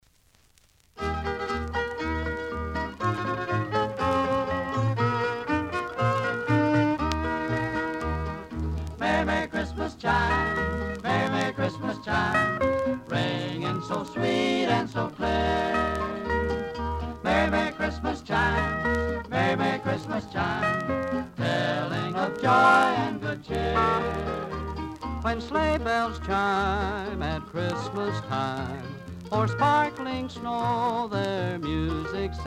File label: Christmas Chimes